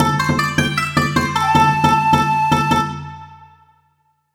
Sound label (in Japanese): 和ジングル